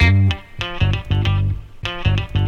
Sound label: guitar raukus, Loops, Phonky